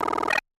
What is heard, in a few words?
Videogame